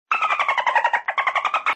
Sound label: notification tone